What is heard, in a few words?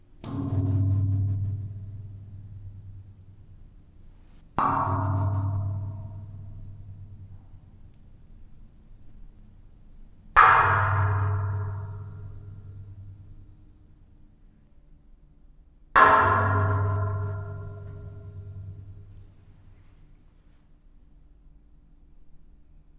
Stairwell metal rail bangs